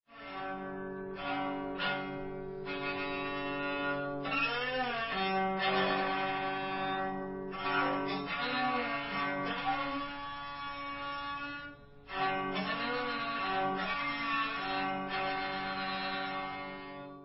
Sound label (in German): steppencello